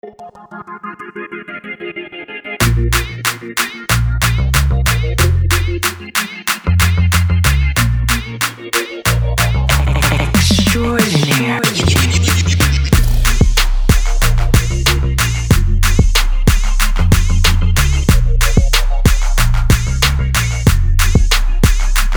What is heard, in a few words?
Instrumental